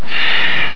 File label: sigh; quake2